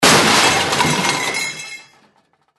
zvuk padeniya na mebel i pogrom

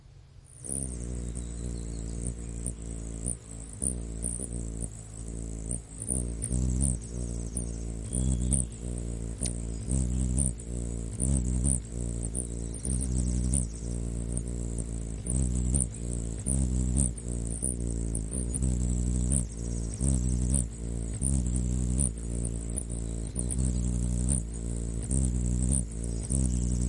kolibri

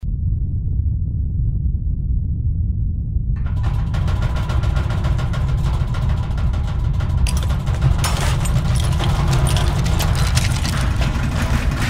zemletryasenie

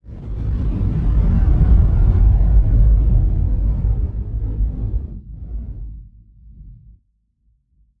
medlennoe dvizhenie v zamedlennom vremeni

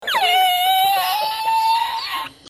smeh

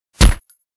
odinoch vystrel minigana

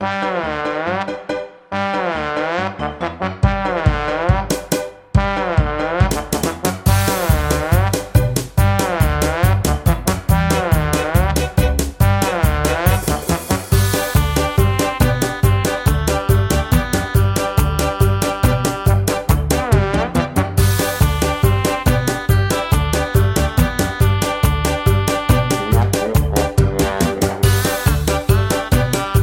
muzyka dlya detskih video

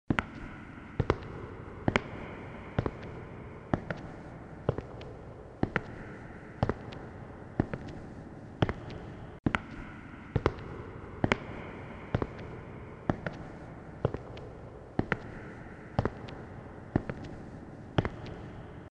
shagi s ehom

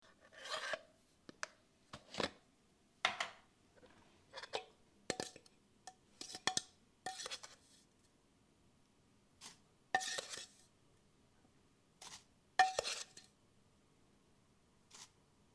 banka kofe

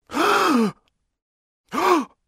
ispug mujskoy

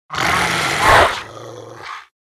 oboroten rychit

